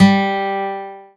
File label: Steel